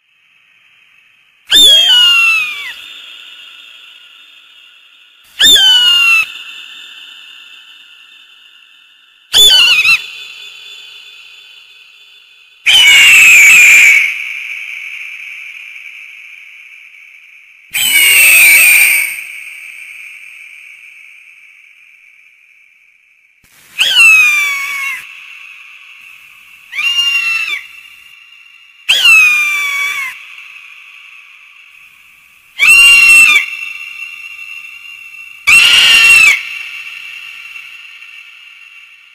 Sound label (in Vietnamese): tiếng chim ưng kêu